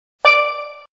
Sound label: Drum